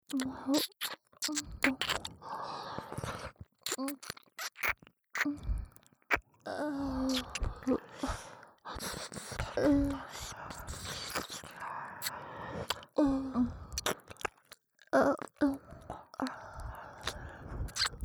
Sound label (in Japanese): 両耳キス舐めループ; KU100